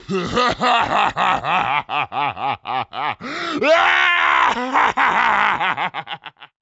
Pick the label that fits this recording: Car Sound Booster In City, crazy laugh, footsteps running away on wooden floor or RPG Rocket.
crazy laugh